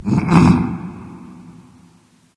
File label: nv clear throat; smite; awilix